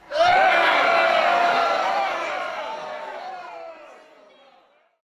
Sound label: Prison Crowd Roar; gamerip